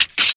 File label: RSS, 160 BPM